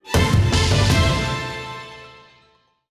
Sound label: Battle Start melodic; Lock's Quest